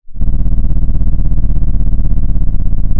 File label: pop, Sound of an engine revving